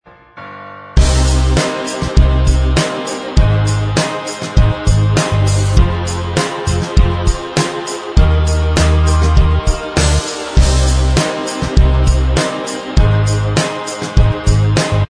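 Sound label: instrumental track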